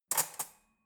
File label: cutlery fork drawer handle